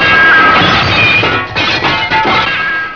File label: SMASH; TOYLAND